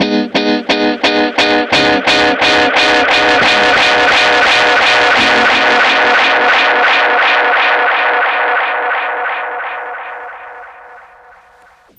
Dub Guitar; Electronic Point Blank

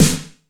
WET S909, WET SNARE; Masterbits - TR-Minator

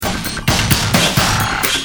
Sick kit, Drums Perc; Industrial

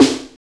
SD, dance drums hip

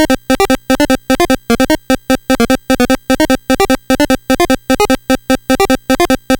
NES Synth, chiptune

